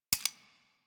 Dual Soma Mech 2D C

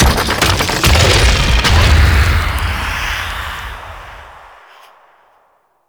Tomb Large Door Open; Mummy Tomb

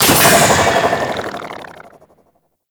Stealth Forward Transition; Warframe; Vehicles; Archwing Stealth

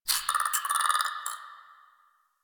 Tree Critters Scared, Ambience; Props; Warframe; Duviri

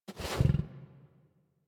interact sleep sniff lay down, Kubrow; Enemies; Warframe; Tame

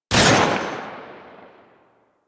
Hand Shotgun Shot; Warframe; Hand Shotgun Prime

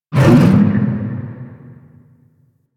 Fishing Gulp; Duviri Maw Fishing

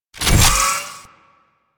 Shock Rifle Equip

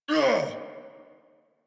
Pain; New War; Kahl

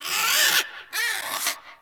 Landscape Close Critter, Ambience; Warframe; Venus Open World; One Offs

